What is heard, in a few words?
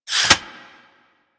Katana Sheath; Swords